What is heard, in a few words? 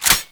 bolt forward, weapons ak; garrysmod